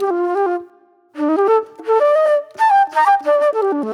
Movie Flute

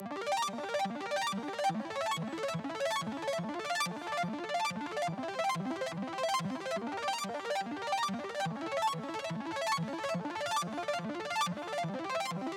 PWM Kit 142BPM Verse - Crazy Arp WET, Gmin; Vengeance - Essential Clubsounds Vol.5; Melody & Synth

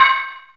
METAL, METAL PERC; Zero-G Ambient 1

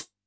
HHCL, Yamaha DD-65, HH

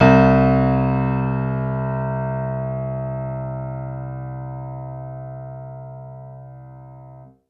Piano Upright c1 hard; TrashLibrary

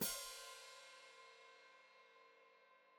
Hat Closed Open, Hi-Hat

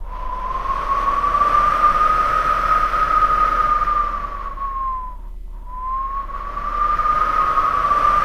WIND HOWL, VOCAL WIND; ILIO; Vocal Planet; Jazz & FX

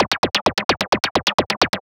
130 BPM, Drum Loops; Zero-G; Club Techno; Drum Set

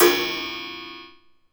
Clock, cartoon Foley Fx; musicradar; cartoon caper

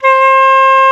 FLT FL C5, Flutes; Roland LCDP04; 90 s Sample CDs; C Flutes 3-8; C Flt Sh 6&7

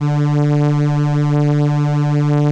ELKA, String Machines; ELKA RHAP

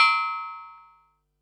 HH, Pearl SC-40 Hihats and cymbals

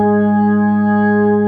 B3 G#2, ORGAN; AKAI S-Series CD-ROM Sound Library VOL-8

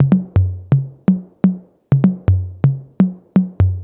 percussion loop 125, Classic Chicago House; Sample Magic; thomas

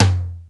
TOM, DRUMS; Crypton - DrumBase5000; T1 DRUMS

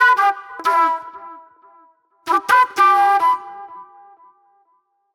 Sharp Delay Flute

